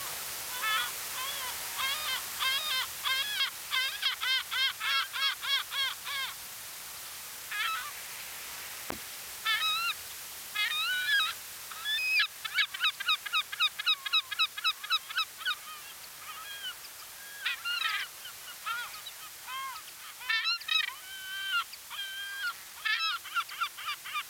klusha larus fuscus, zvuki chaek

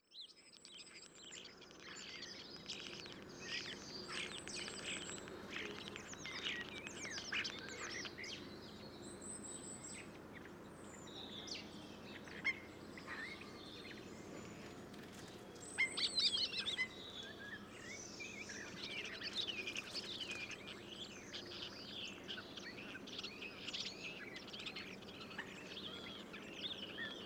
skvorets sturnus vulgaris; peniya ptits

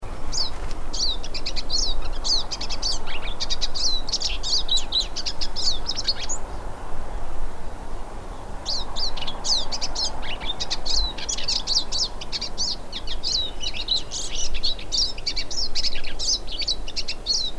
sosnovyy chizh spinus pinus, zvuki peniya ptits

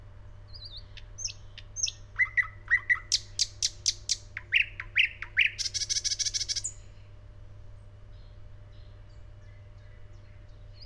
solovey luscinia luscinia; peniya ptits

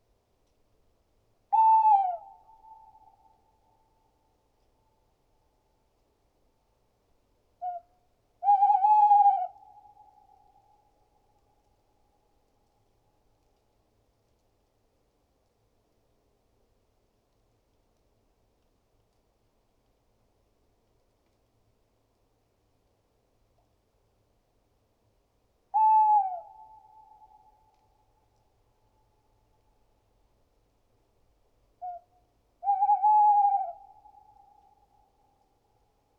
seraya neyasyt strix aluco; nochnyh ptits

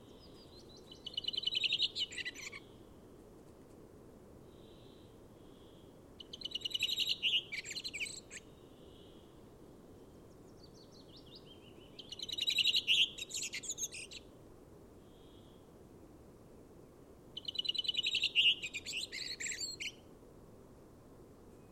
belobrovik turdus iliacus, zvuki nochnyh ptits

